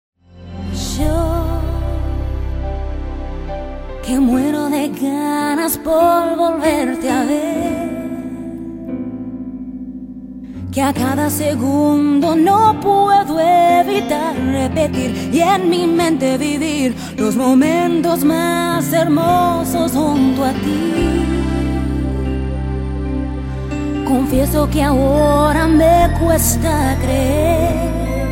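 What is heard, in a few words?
Singing Spanish, Female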